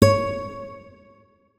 notes, guitar; Hideout Furniture

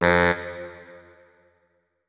notes, saxophone; Hideout Furniture

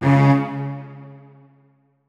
notes, keyboard; Hideout Furniture

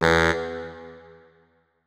notes, saxophone; Hideout Furniture

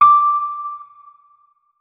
notes, keyboard, electric piano

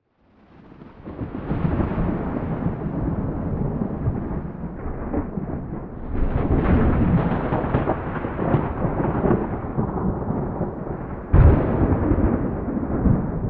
close, nature; Atmospherics

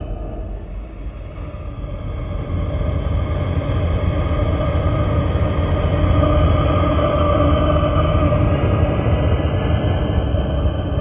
psy polter, anomaly; Desmans Horror Overhaul

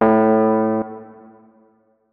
notes, keyboard, electric piano; Hideout Furniture

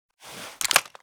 vihr draw, weapons; Blindside's Weapon Reanimation and Rebalance - Military

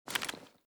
mossberg open, weapons; Mask's Reanimated Anomaly Arsenal

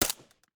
smg9, bolt; weapons; JSRS Sound Mod

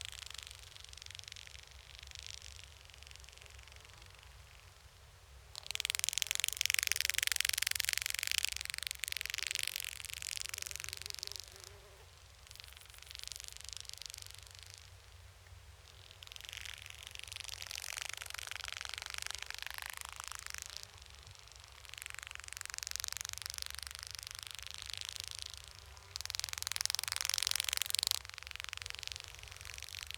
net idle, anomalies; Arrival

